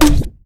Cowboy Shoot; Risk of Rain

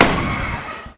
Child Death; Risk of Rain

